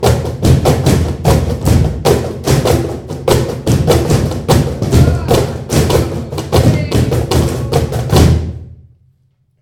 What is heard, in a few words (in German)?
Cajon Workshop Teaser